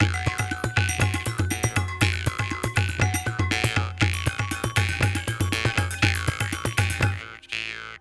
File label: percus; rayman origins 2011 gamerip; jungle world